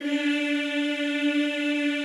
EEH D3, Classical Choir; Best Service; Extended Classical Choir

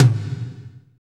TOM, Drumscapes Roland; Northstar - Drumscapes Roland

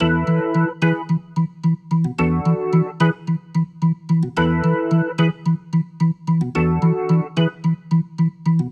Rotor Riff, Organ

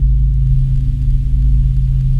ATMO PAD, Dance Mega Jungle Rave; Best Service

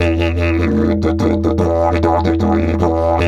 E 150, DIDGE RIFFS

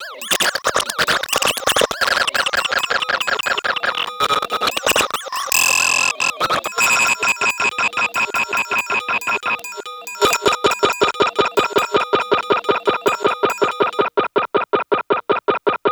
el blurps, special FX twisted

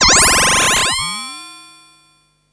FX, Drum & Bass

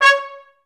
TR STAC C#4; Best Service; Peter Siedlaczek's Advanced Orchestra

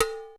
COWBL, SY99; Crypton - DrumBase5000

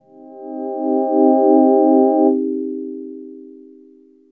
voice, dx7

